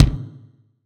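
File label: acoustic bass drum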